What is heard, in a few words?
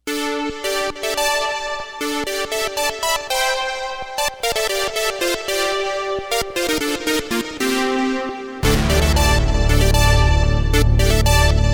moog modular